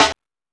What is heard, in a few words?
Techno C; EMU XL-7